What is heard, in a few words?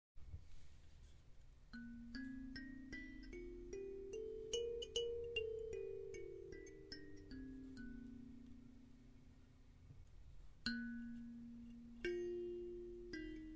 Kalimba